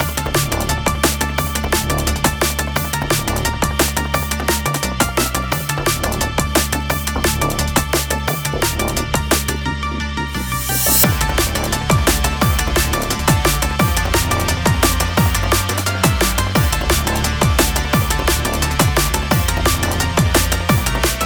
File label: Desert; Knight Squad 2